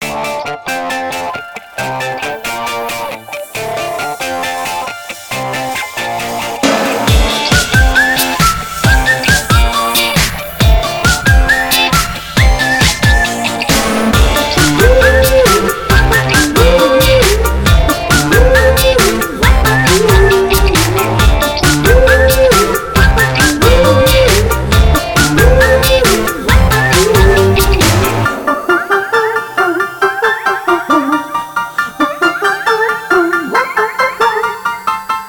sonnerie mobile

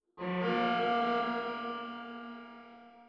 évacuation

